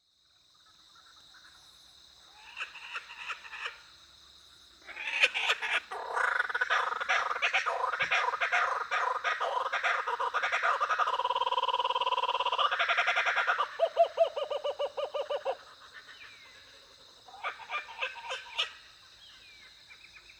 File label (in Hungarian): kacagojancsi Dacelo novaeguineae